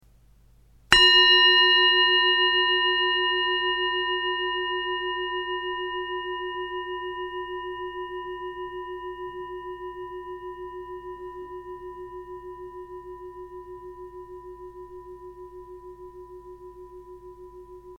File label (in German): Klangschale